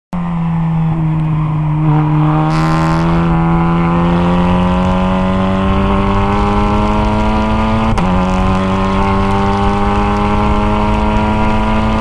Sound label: TCR Full Speed Sound, rs-3-lms; motorsport